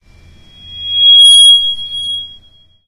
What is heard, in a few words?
feedback; Left 4 Dead 2; Source Engine